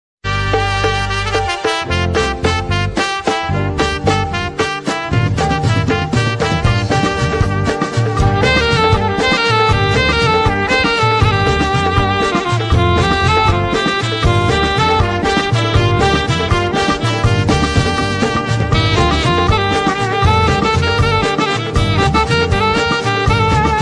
brass band saxophone music ringtone brass band saxophone ringtone short